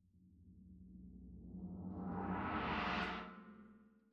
Tam-Tam; Tam-Tam Rear

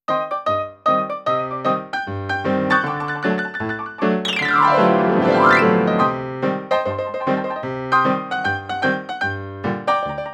piano, Ragtime

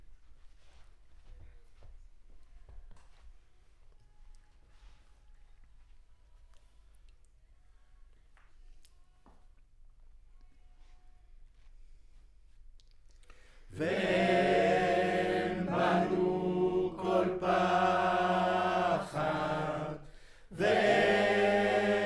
Center u47, Choir

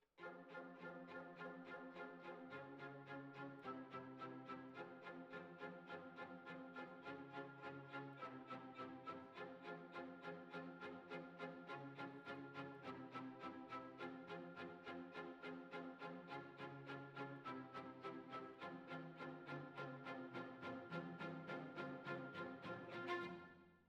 Violins Over

